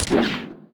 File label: Net Shot